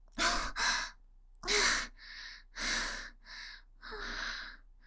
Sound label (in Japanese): 吐息・はぁはぁ; 舐め屋; ゆるふわ羊のパティシエさん; 首輪ちゃんのわけありや